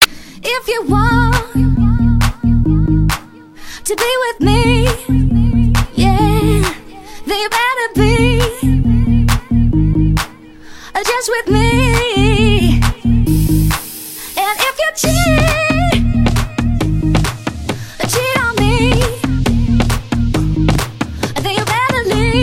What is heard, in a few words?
Pulse